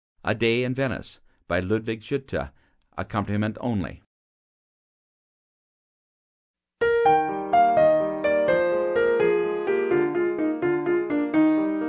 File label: Accompaniment Only; Grade 11 Solos